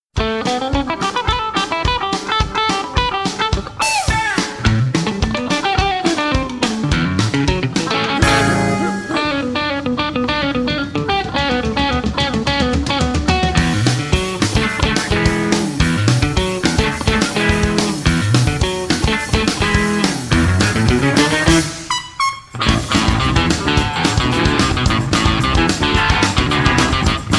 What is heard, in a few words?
bossa; bossa 2000 turbo